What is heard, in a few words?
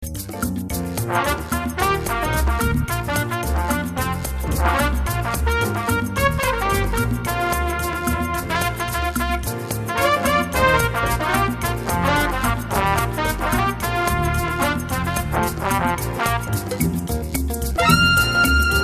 Afro Cuban Jazz